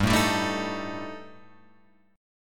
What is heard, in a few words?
G Suspended 2nd Sharp 5th G down Guitar Open F, Gsus2#5 chord; strummed; JGuitar